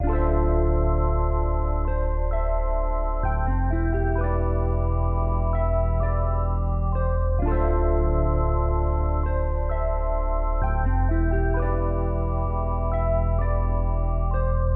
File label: R&B Melody Loop 130 BPM A Min; Cymatics; Oracle Sample Pack